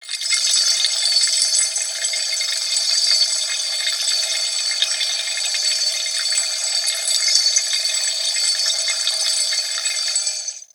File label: Water Stream Glassbreak, Chalice Well